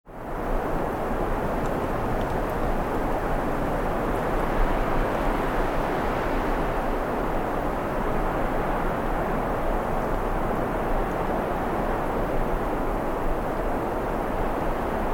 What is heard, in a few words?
Wind in Tall Pines Open Valley, Wide stereo recording; Wind Sound Effects